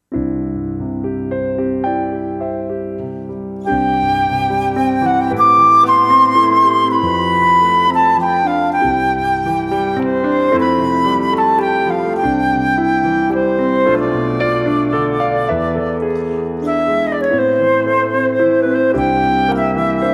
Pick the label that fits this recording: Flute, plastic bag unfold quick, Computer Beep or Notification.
Flute